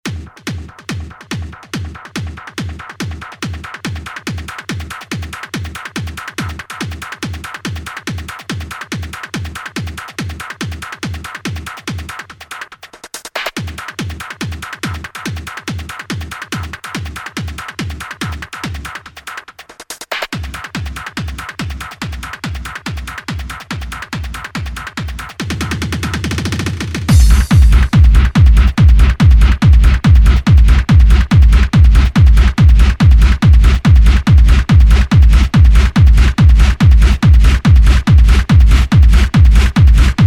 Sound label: bass; FAN REMIXES